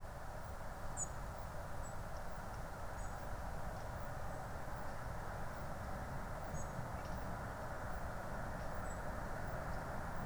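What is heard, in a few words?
Rotkehlchen, robin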